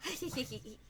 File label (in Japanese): 笑い